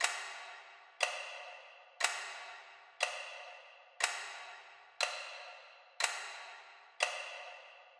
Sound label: Clock Second Hand (Reverb Loop)